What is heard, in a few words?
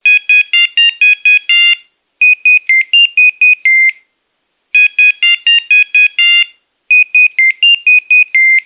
nokia ringtone